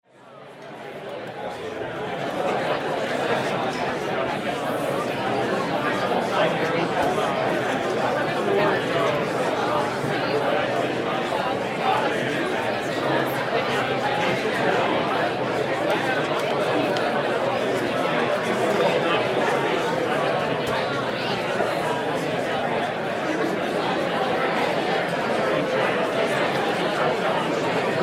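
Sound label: sounds of feast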